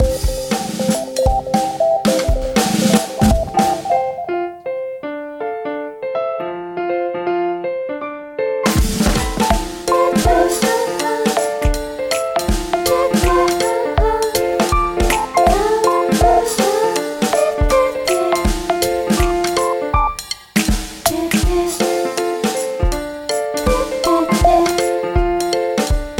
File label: bgm